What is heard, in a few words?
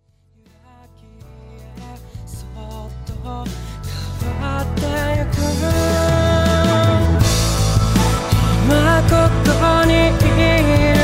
metronome